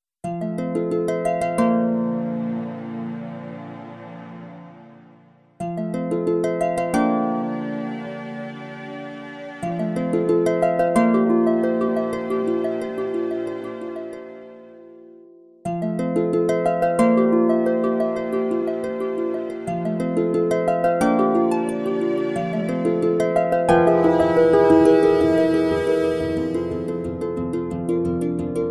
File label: vocally 432 hz